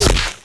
body hit; XDM

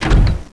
door stop; XDM

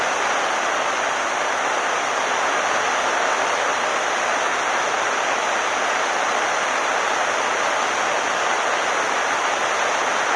propane, ambience; XDM

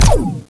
plasma fire, weapons; XDM

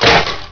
wood, debris; XDM

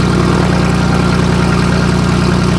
fan motor idle loop, airboat; XDM; vehicles

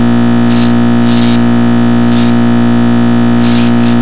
fluorescent, ambience; XDM

